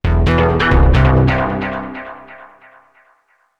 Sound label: Oneshot Synth; Zombie Army 4 Dead War